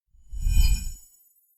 sound Rotating Blades

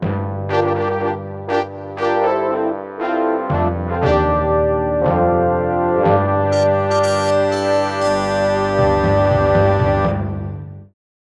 Fanfare; Fanfare II; Dungeon Level 8 Completed; Advanced Dungeons & Dragons Slayer; 3DO

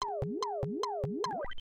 Lose; puyo puyo tsu pc at dos

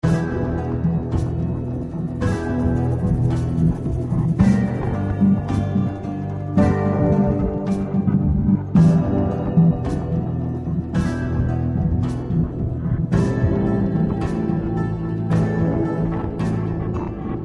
Loop Tense; tales from the borderlands gamerip 2015

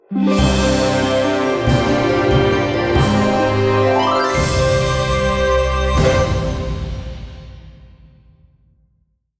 Victory!; A Boy and His Blob

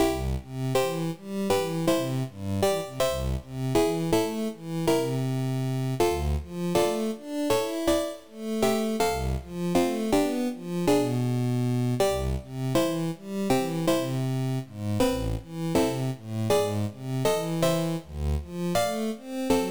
Nostalgic 8-Bit Remix

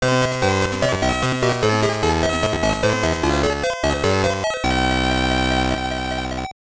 Congratulations!; Mario's Picross; GB

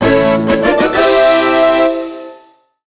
Jingle ~ New Character!; mario super sluggers; wii

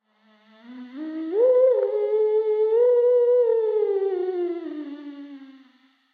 Theremin

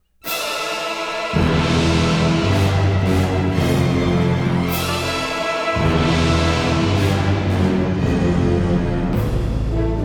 Fire Giant March; Baldur's Gate II Enhanced Edition; ToB Unreleased

